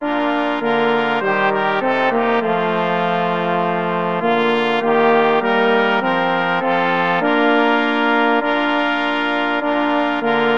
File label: ding dong,brass quartet